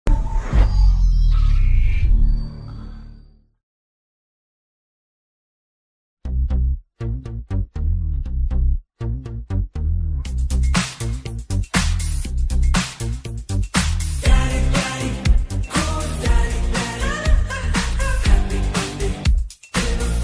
bgm ringtone

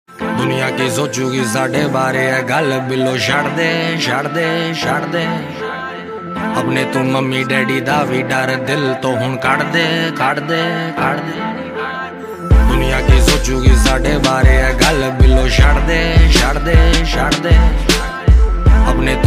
viral ringtone bgm ringtone

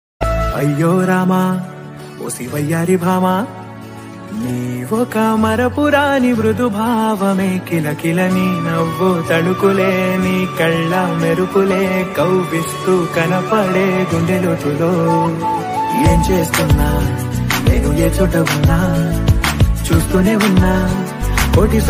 telugu ringtones love bgm ringtones; RX100 movie

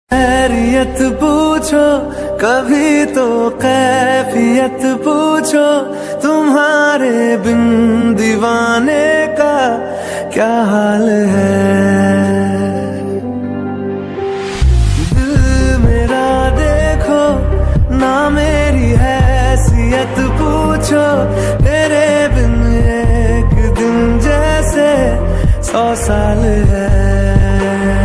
song ringtone movie song ringtone; chhichhore movie

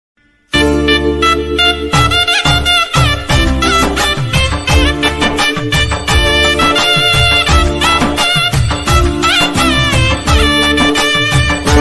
lord ayyappa tamil ringtones; ayyappa new bgm

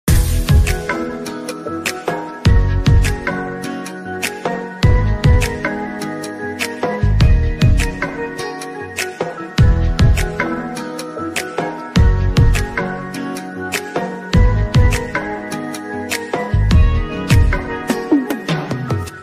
instrumental ringtone

